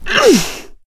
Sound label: melee swing